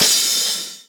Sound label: Crash, Cymbals; Vengeance Samples